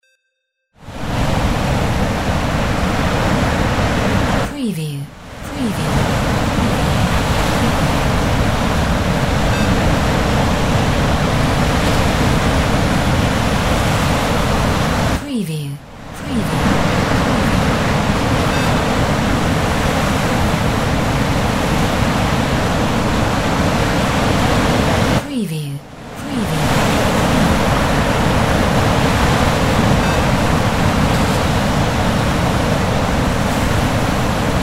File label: SEA ROUGH NOSPLASH; windy beach audio